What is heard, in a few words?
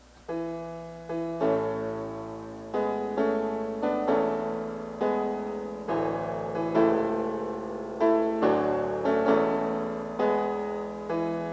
piano sonata in a minor 2nd movement